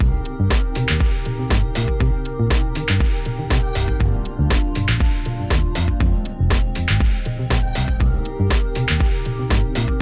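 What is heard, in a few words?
string mix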